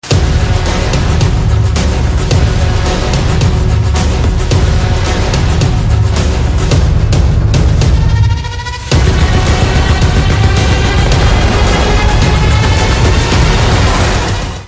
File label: trailer bg tone; Tiger Zinda Hai; Bollywood